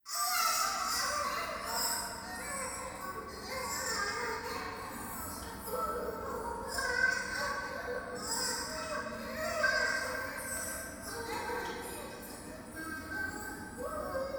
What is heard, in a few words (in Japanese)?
トイレ シャブ喘ぎ 電車